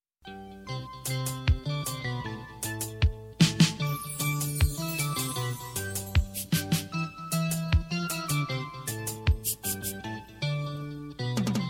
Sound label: reggae christmas; ringtones